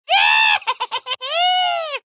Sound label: Laugh Witch, Female laugh, witch, cackle with shriek, (human voice); Laughter Sound Effects; Sound Effects:Voice; VoiceBox